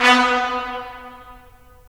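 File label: brass trumpets staccato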